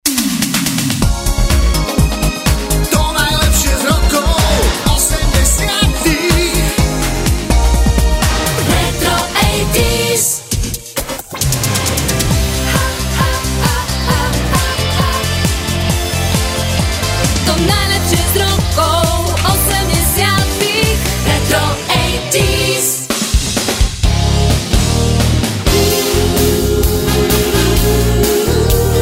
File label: retro 80s